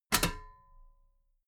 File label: metal ladder step regular clank